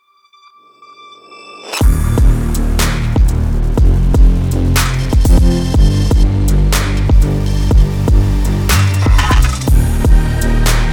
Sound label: Witch House Presets for Serum 2; trap; Black Octopus Sound; Grimoire; Tim Schaufert